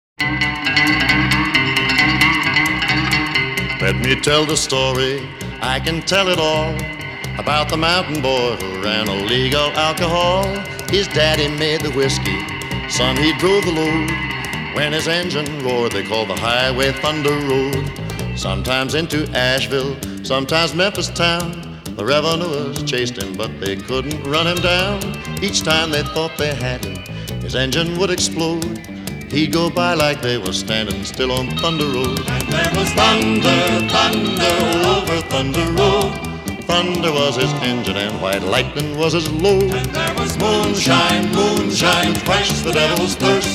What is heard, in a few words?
Ballad